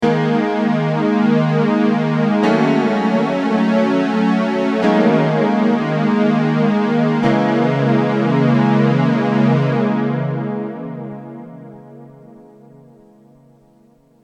chorus

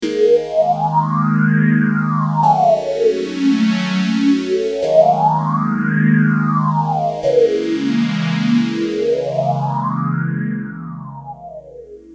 phaser

